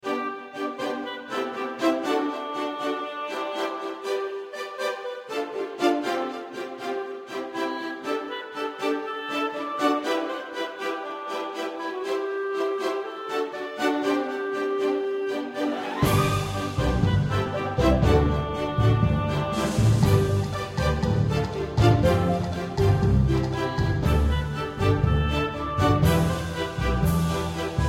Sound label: music; New Yankee in King Arthur's Court